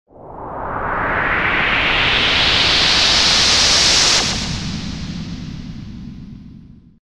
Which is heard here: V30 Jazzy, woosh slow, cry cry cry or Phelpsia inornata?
woosh slow